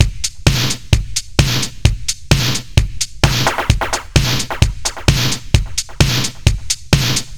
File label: Beat Katana; Zero-G; Future Wave; Beats